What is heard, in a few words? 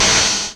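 open hi-hat; Mirage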